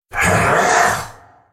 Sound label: Droid spellcast effort; Khazix; Character Voices; English